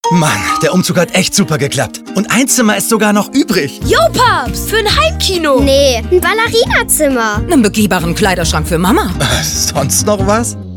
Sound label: Funk; Targobank